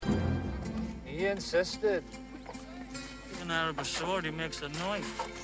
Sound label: knife